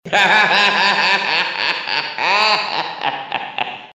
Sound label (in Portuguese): risada; paraiba